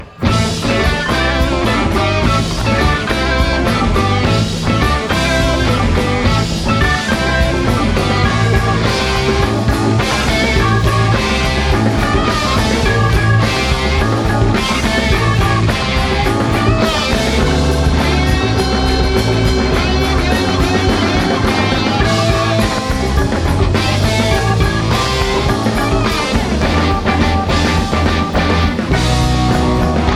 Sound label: jazz